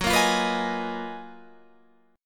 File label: F sharp Major 13th F sharp; F#M13 strummed; 14,13,15,16,16,16; chord shape